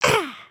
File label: breakdance aaah; emotes